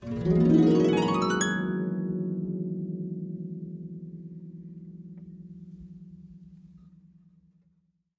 Harp Ascen Gliss 1; strings harp

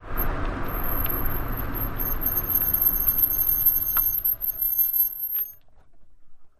Car Break Squeaks St, brakes

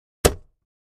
Axe Paneling 1 Side, axe hits

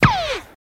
Machine Gun Silenced, silenced bursts

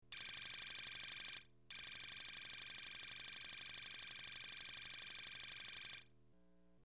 Constant Beeping Vid, beeps & tones

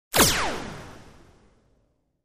Laser Short Fire Low

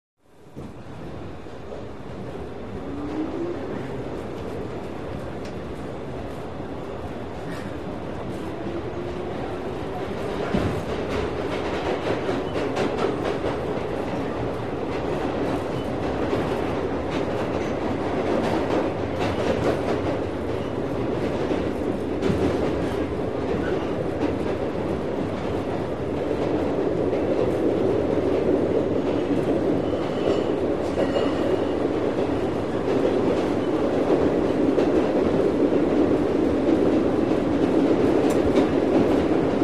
Interior Old Subway, subway

